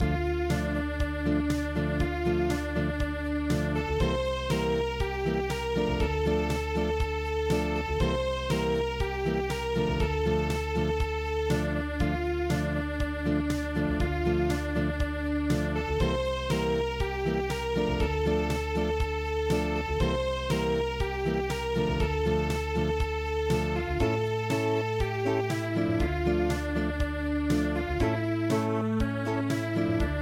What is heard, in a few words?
Acoustic